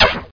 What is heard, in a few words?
SHOT HIT; gubble